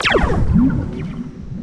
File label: grapple; Quake 4 Alpha; weapons grapple